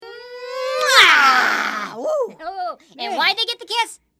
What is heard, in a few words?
big kiss